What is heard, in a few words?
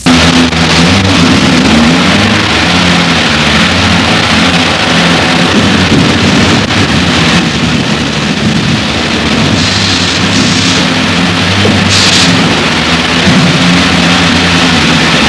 crusher